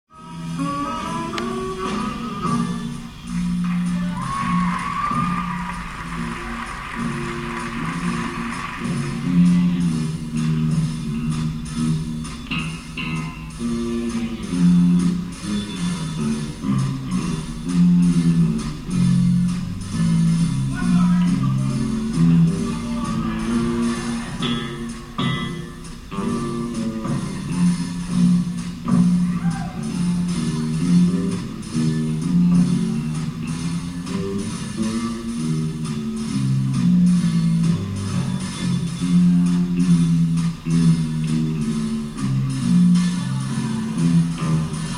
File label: bass solo; a slightly funky "walking" bass line